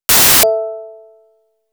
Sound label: tone, Ringtones; Oppo R821